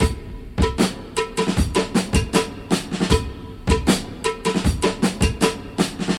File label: 78 bpm hq drum groove c key, Free drum loop - kick tuned to the C note. Loudest frequency: 1603Hz